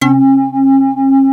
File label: WHIRR; Greytsounds VOL-1